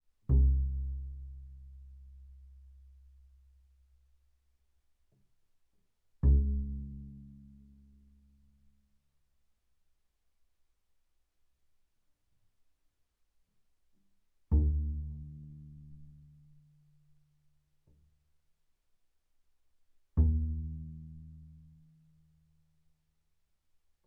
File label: Bass pizz sul E ff C2 B2, double bass